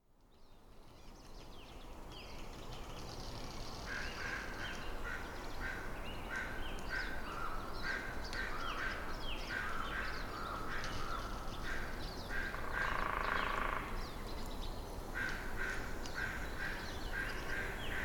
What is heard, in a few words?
Forest Night; ambience; Gentle breezes wafting through the trees overhead, the sounds of the night spill forth like jewels.